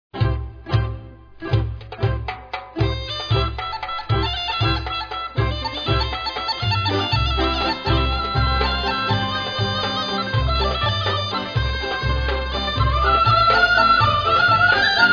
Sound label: Melodies for Orchestra